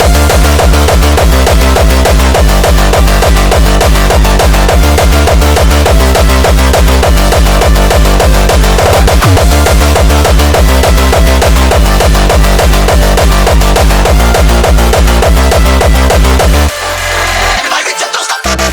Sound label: loop